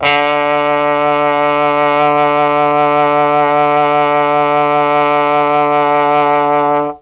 D2 TROMBONE, Mellotron; CHMB TRMBONE; Mike Pinder - Mellotron Archive CD

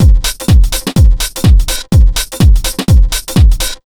BEAT, Disco House; USB Soundscan vol.20; AKAI

